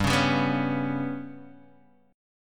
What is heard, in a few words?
G Major Flat 5th G, Guitar Open D; chordshape; down